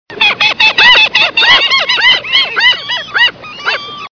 pinguin

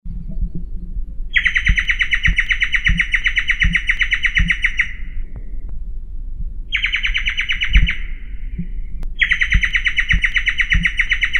suara giant antshrike

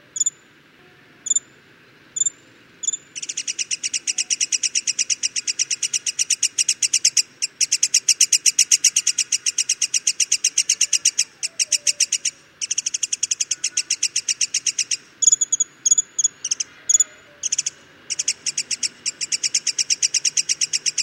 sibia kepala hitam

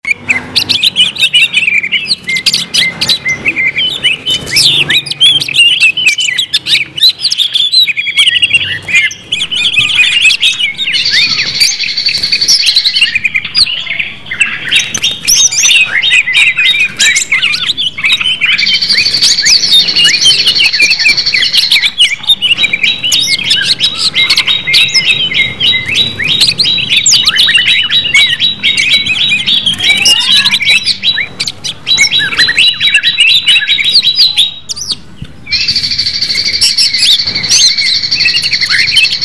audio simulasi lomba cucak hijau

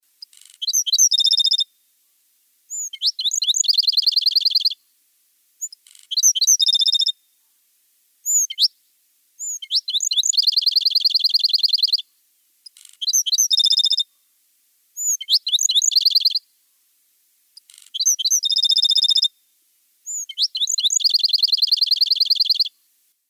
suara blackthroat sparrow

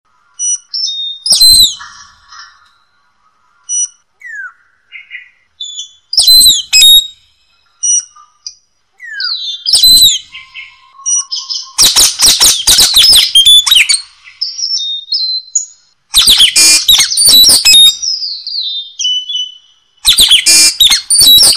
nuri tanau

